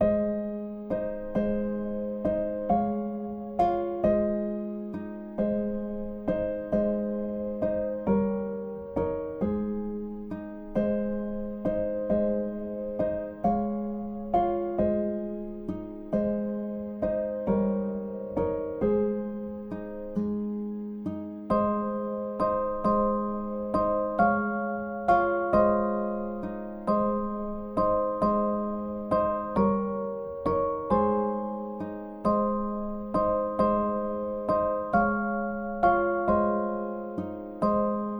Lullaby, Sleeping Baby Music

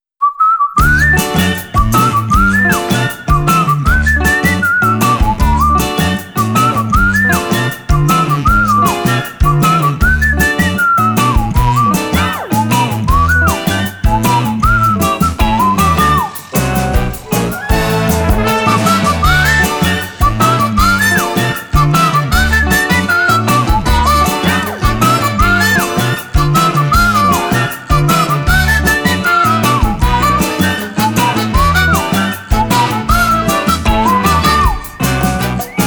Positive Whistle

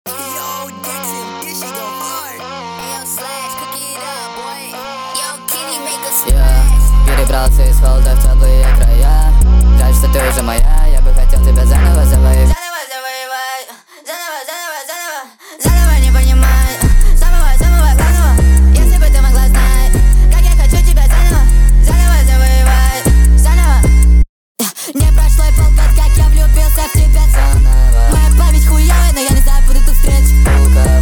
soft nightcore

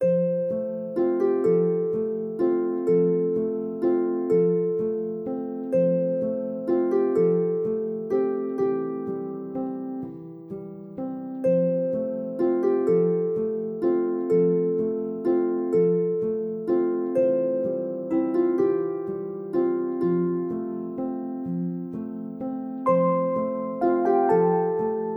Nursery Rhyme Instrumentals, Lullaby

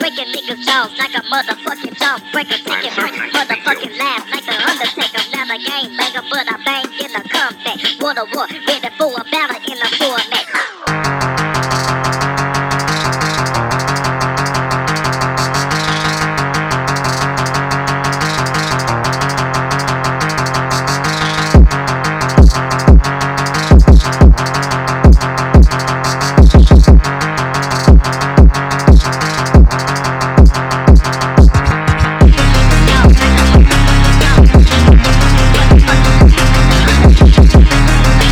HARD PHONK

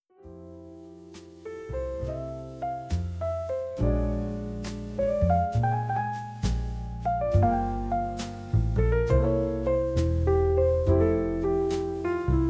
Jazz Sax

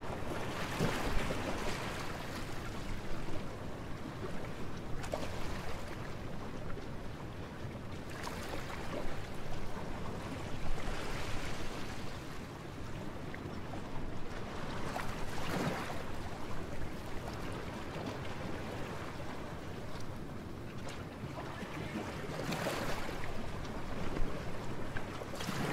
Sea Port Water White Noise

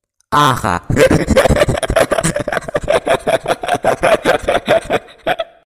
ahahahahah

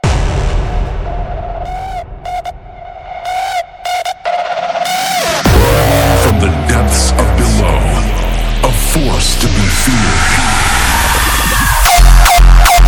hardstyle, Rawstyle

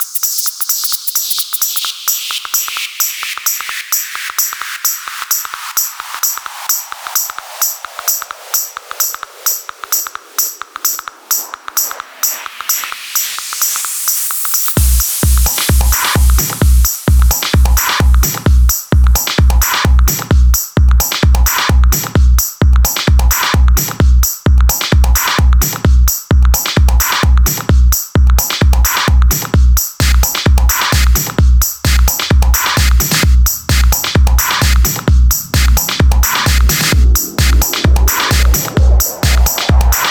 Clikity Clack Remix

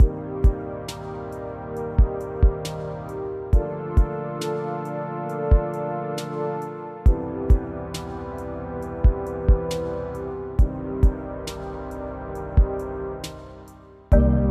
Instrumental

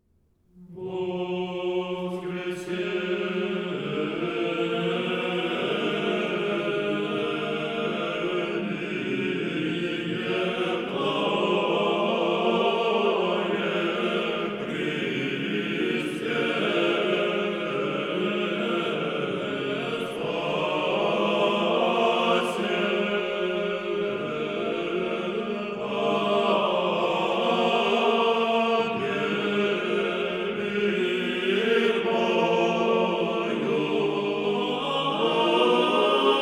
Choir, Stikharion Mode 6

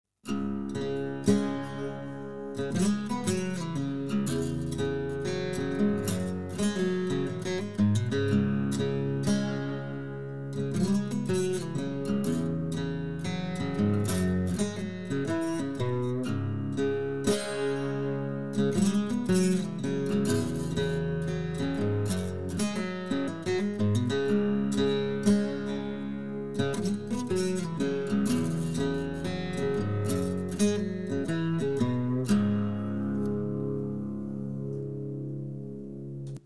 Acoustic

